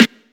short snare drum sample a sharp key, acoustic snare sample tuned to the A# note; snare drum samples